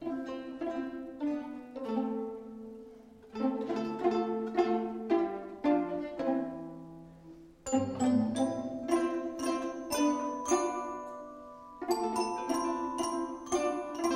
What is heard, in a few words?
Instrumental